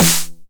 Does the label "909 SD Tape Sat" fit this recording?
Yes